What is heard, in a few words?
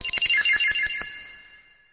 magic; Pokemon Essentials; PKMN Estelar 1.3